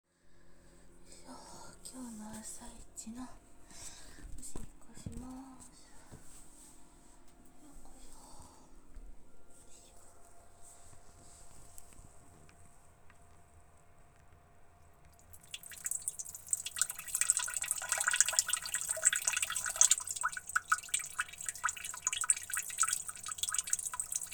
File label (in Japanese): 朝のトイレでオシッコ; ７日目・本日最初の